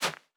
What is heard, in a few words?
Footstep Sand Running; Character Sounds